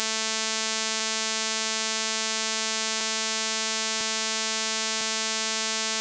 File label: flattest waves full